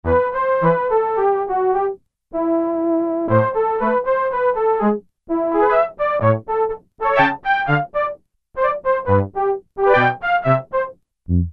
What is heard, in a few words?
Korg Poly-800, Synthe Flute-Brass